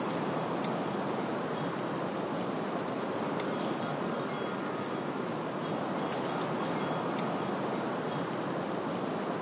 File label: Winter Ambience; Angry Birds 2